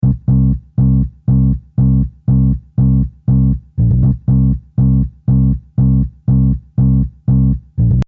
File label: Bass